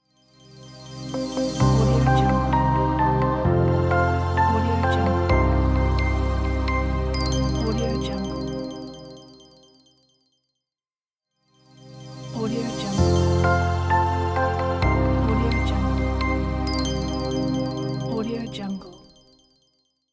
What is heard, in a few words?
sad inspiring background piano logo